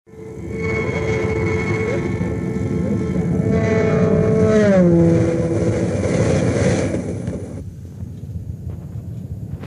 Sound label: Metroliner Bush River Bridge